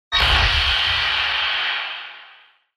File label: UI comform sound